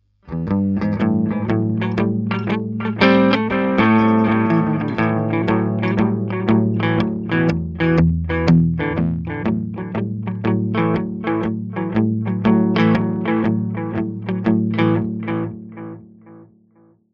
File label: delay